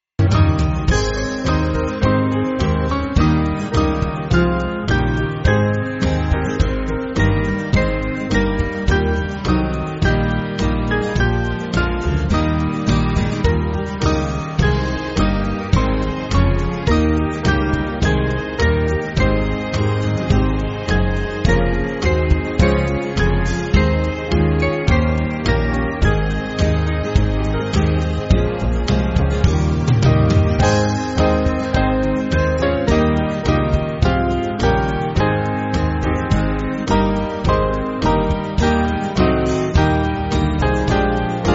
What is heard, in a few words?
Band; Awake O Lord; Chilton Foliat